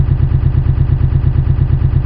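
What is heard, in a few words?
Compressor Loop, Train; OpenBVE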